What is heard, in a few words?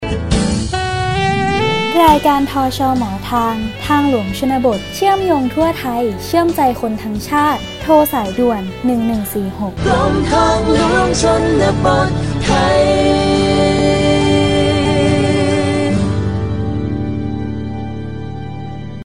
Jingle; ภาคกลาง